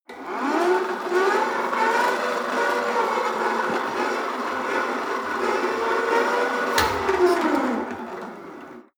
rope trainer pull sequence slow long, fitnessstudio